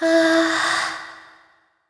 Vox Think; en; voices; heroes; kings raid

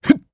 Vox Jump, jp; voices; heroes; Lusikiel; kingsraid

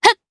Vox Jump, jp; DarkFrey; kingsraid

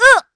Vox Damage; Estelle; kingsraid; voices heroes

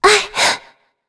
Vox Landing; Shea; kingsraid

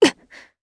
Vox Landing, jp; Tanya; kings raid

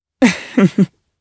Laugh; Lucias; kingsraid; jp

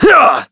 Vox Attack; Shakmeh; kingsraid

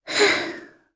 Sigh; Yuria; kingsraid

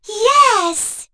Vox Happy; Kara; kingsraid; voices heroes

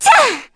Attack, kr; Ophelia; Kings Raid

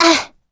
Vox Damage; kingsraid; en

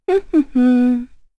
Vox Hum; Epis; kingsraid

